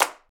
good clap d sharp key; percussion; single hits one shots

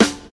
Subby Snare Single Hit D Key, steel snare drum

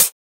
Natural Pedal Hi-Hat One Shot C Key; hat samples

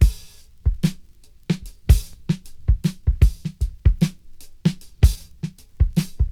76 bpm breakbeat g sharp key

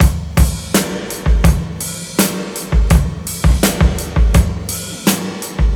84 bpm breakbeat b key; drum loops

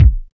good kick drum single shot a sharp key

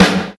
clean steel snare drum g key; snare drum samples

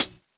sizzle hi hat c sharp key; hits one shots; hat samples; Duration 0:01; Samplerate 44100 Hz; Channels Mono

